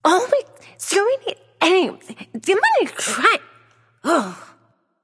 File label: grumble; daji